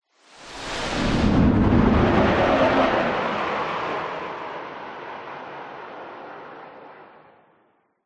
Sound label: vehicles aircraft fighter jet pass by fast designed